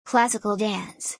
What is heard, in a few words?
classical dance